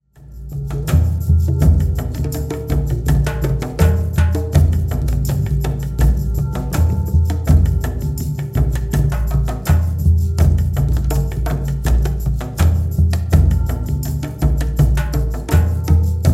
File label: South Wind Drum Call